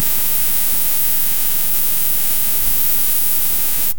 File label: a damp dull thud hit, impacts